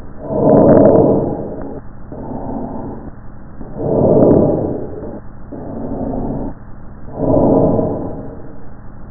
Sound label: Oxygen mask; Macworld (1998-02); From Macworld Readers